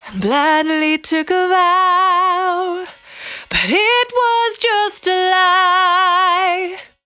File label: Cry 68bpm B, Vocal; Zero-G